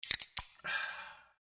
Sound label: camera shutter, Sony Ericsson; Z555i